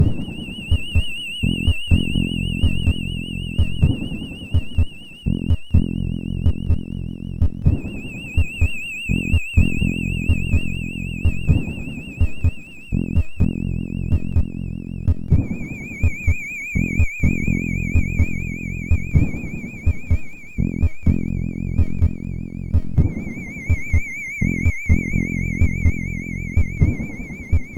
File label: Oriental Music